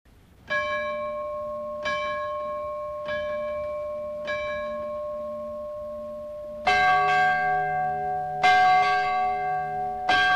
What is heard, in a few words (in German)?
turmuhr